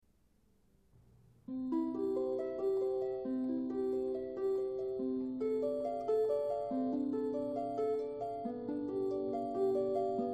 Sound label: flute harp ave maria; gounod